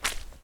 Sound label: barefoot mud; Apotheorasis